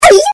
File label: good pain; CAC ASSETS